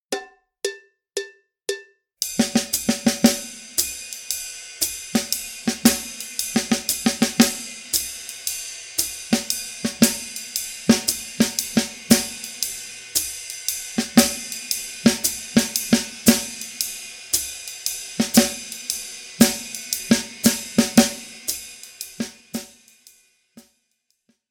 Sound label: Comping, Jazz Latin; Schwarzes Album; Bum Bum Tschack